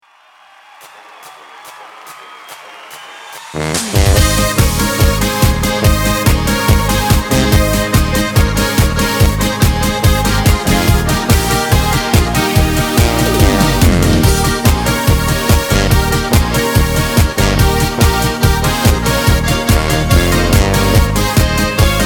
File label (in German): Karaoke ohne Chor